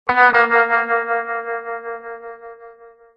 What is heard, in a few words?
notification sound; team fortress 2